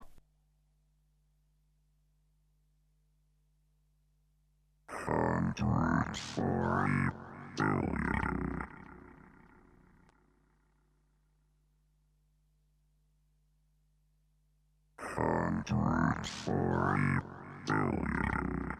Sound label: Vocals; PixelJunk Shooter; PS3